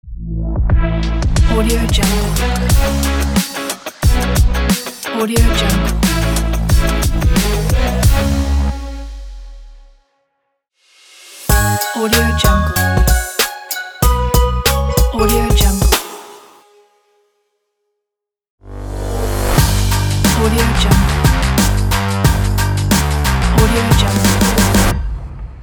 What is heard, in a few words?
hiphop logo pack